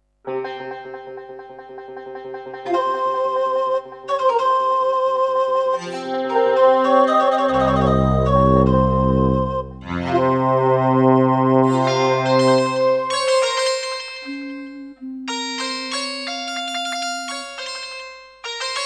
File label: Hammond GM-1000; Prince of Persia 2